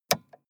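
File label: sports car interior switch on release; verkehr transport; autos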